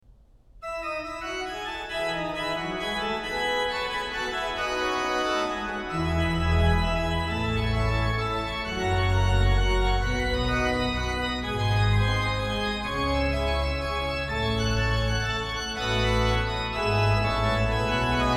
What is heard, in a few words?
Praeludium F BWV 536